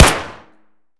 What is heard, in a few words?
tt fire indoor close; Escape from Tarkov